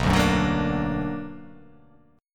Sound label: B Minor Major 7th